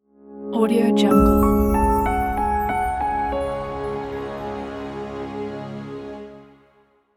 cinematic chimes piano logo

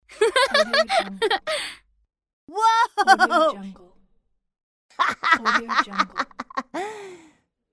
boy laugh

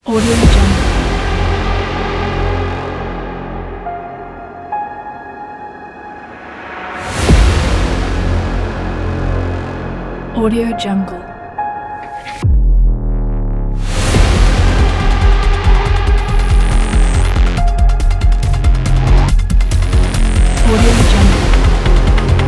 horror trailer

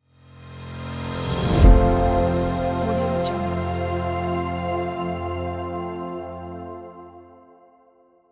intro sound

